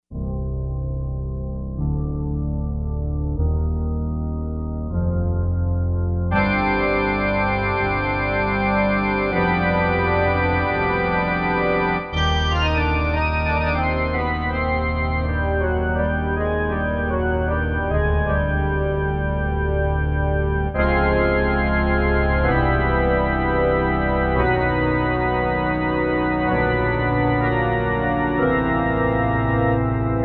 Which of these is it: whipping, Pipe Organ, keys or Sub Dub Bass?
Pipe Organ